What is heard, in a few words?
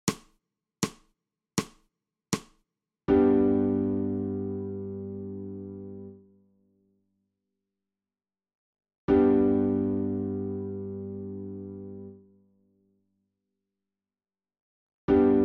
SOPRANO